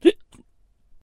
hiccup

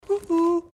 woo hoo; frozen

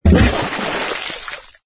splash

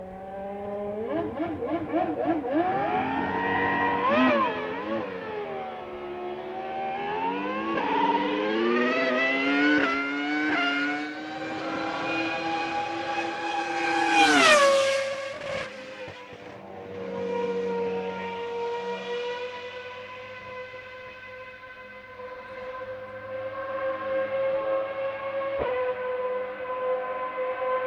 formula 1

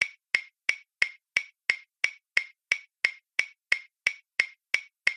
tick tock

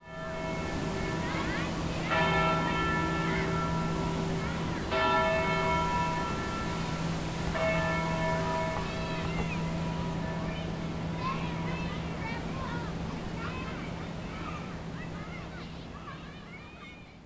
Church Bell

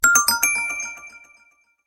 splashes notificacion; oppo

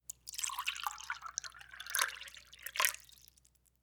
Pouring Water

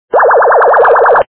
ufo

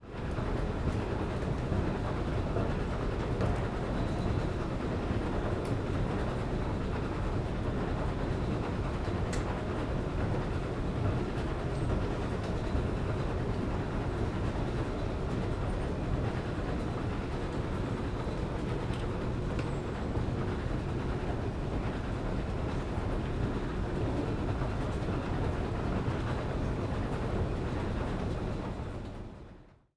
Escalator Ride

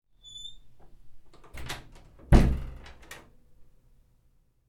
Door Close

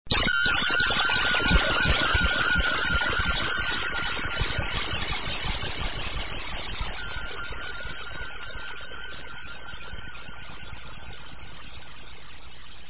space radio

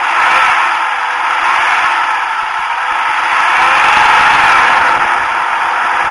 ferrari engine

